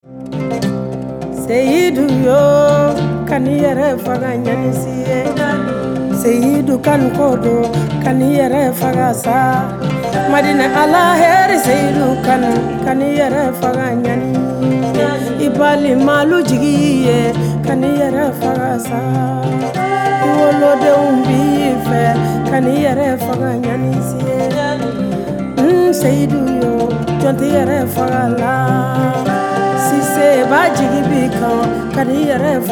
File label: Acoustic